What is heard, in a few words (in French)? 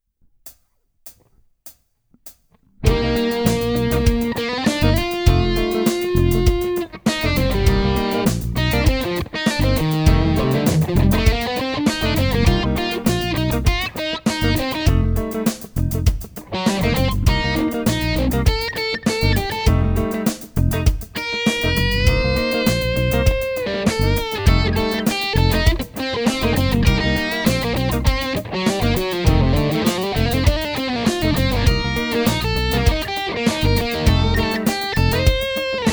do dorien improvisation, guitare